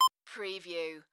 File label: Digital Beep Basic Function